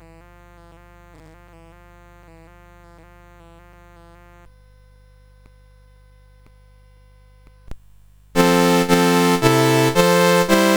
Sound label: Angels we have Heard on High, CSG8580R5